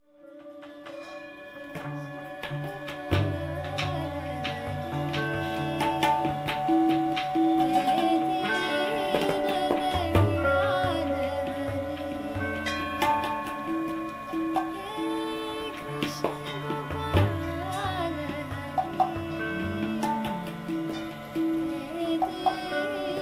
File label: bowls